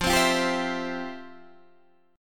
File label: F Major 7th Suspended 2nd, F, down, Guitar, Standard; FM7sus2 strummed; JGuitar